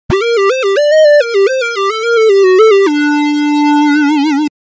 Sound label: solo; opxvst